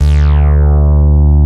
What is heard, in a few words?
MICRO MOOG; E-MU Formula 4000 Series Vol. 3; Analog Odyssey